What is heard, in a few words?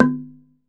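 TUNG LO, Tongue Drums, Pitched Percussion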